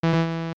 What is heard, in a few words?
Error; Zelda